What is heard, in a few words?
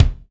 KIK, Hip-Hop Kits, Roland; Northstar - Drumscapes Roland